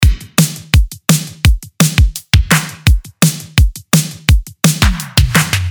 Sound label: DRUM LOOP RETRO 169BPM